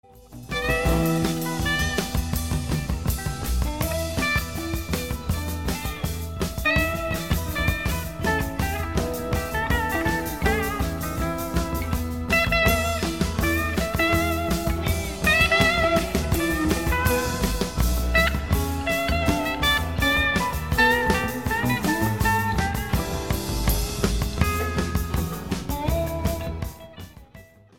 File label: Jam